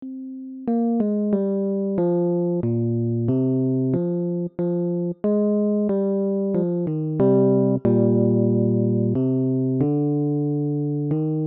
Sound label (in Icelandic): Bassi og solo Brudkaupsljod